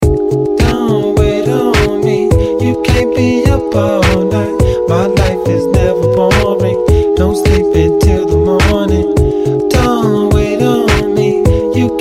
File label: Riff